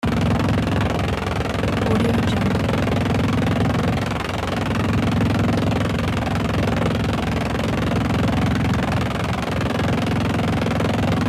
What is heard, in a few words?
space helicopter